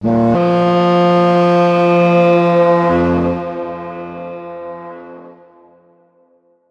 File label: Battle Horn; Middle-earth Shadow of Mordor